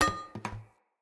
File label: Hunter Bow Drop Arrow; Weapons; Enemy; Bows